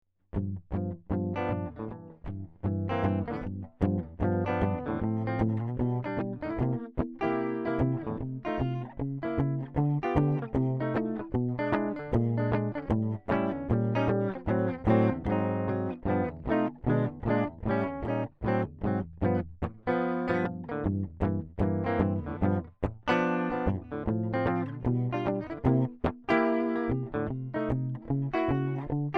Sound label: Jazz, Gibson Firebird